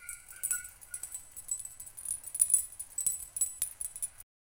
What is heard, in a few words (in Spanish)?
jadeo de perro, bark